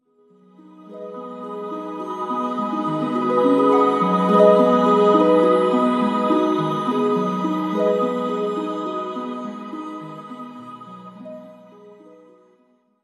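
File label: song of discord melody; sona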